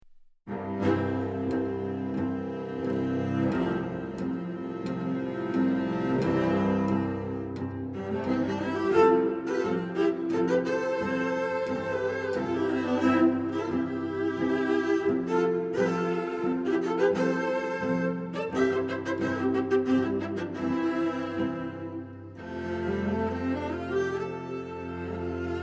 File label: Cellos Trio